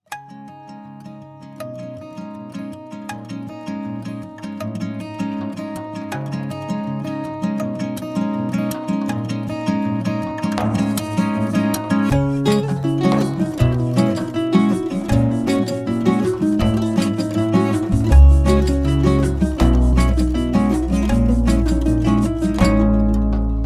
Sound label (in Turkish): Akustik ve Bas